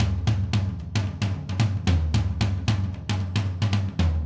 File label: Acoustic Live Drum; Platinum Audiolab